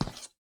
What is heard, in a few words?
ceramic footstep; everness